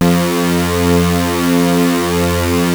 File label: Prophet 5 F3, Synthesizer; Hollywood Edge - Giorgio Moroder Rare Synthesizer Collection